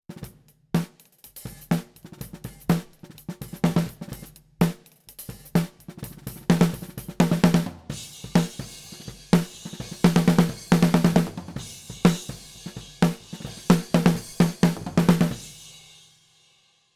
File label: amb snr top km84